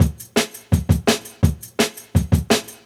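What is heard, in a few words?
Jungle Breaks, 168 bpm; Original Jungle Breaks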